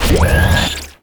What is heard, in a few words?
Scifi Forcefield Activate; SFX Kit; Energy & Forcefields